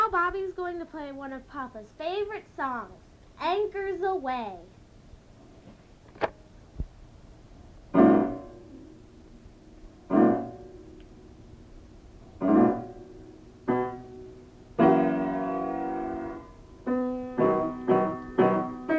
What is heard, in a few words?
Anchors Aweigh - piano